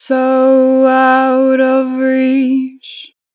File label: Natural Vibrato, Vocals, 2 bar vib; Reach; Harmor Vocals